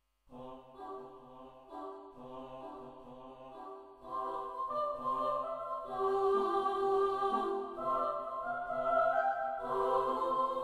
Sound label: Choir